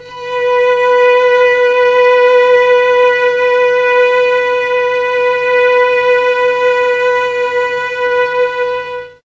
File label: B4 300 VIOLA, mellotron, STRINGS